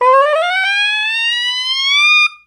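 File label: long bend up, soprano sax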